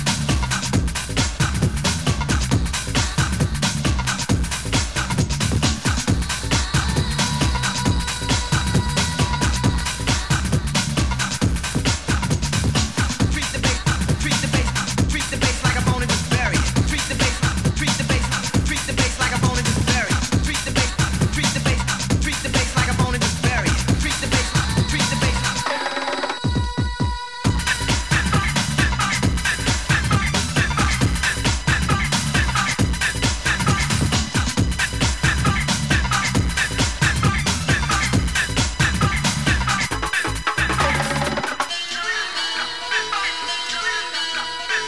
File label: BASS FUNK, DNB; Single Sided Blue Vinyl; vinyl plays to a VG+ grading; light surface marks